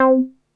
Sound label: NASTY B, BASSES; Masterbits - Vintage Voltage; VV BASSES